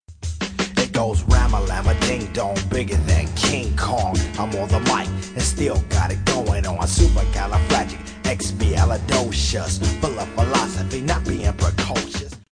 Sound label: Music In Style